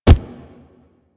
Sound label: Truffle Jugger Step Default; Warframe; Infested; Juggernaut; Truffle Hunt